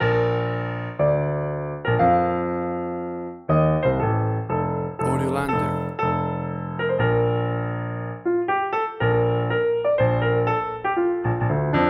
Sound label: Argentina National Anthem Piano